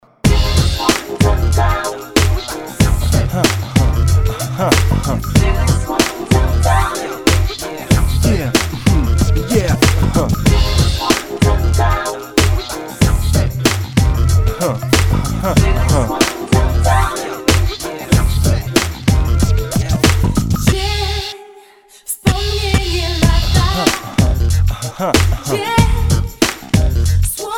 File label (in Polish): rap